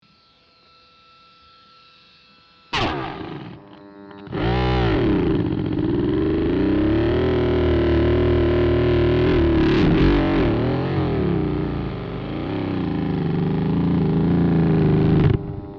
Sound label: bike